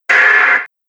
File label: The Whoosh Shot